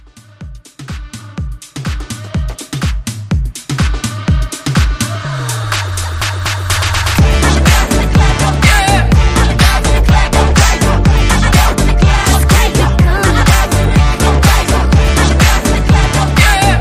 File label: Club Hype